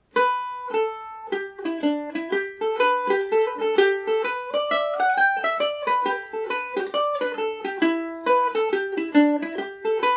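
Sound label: G major, irish mandolin; little stack of wheat revisited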